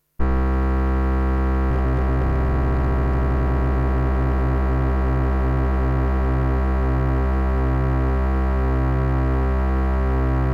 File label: lfo pwm; arduino